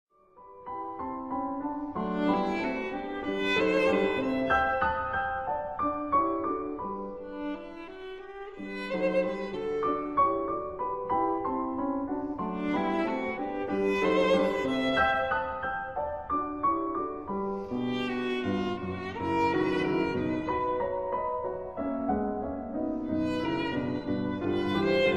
Sonata for violin & piano No. 10 in G major, Poco Allegretto; The Cockcrow; Op. 96